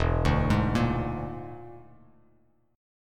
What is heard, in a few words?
E 7th Flat 5th, down, Bass Standard; E7b5 arpeggiated; JGuitar